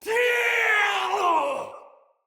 Battle Cry, Soldier Male; Barks; Dialog; Dendrit

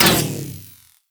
Pull Grapple On; Warframe; Mag

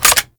Reload Dual Pistols; Warframe; Grineer; Queen Guard

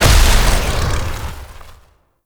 Veilbreaker Jetpack Start; Warframe; Vehicles

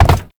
land glass; Movement; Warframe; Uriel

